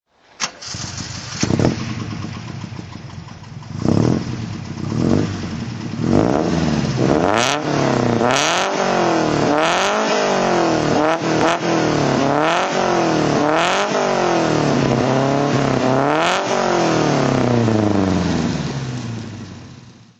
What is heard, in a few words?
alfa romeo giulia 1600 sprint gt throttle